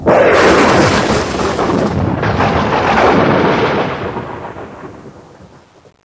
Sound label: thunder